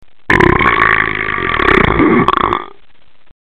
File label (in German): ruelpsen; Rülpsen 11